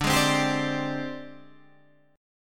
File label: Ab Major 7th Flat 5th D